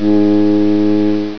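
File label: FOG HORN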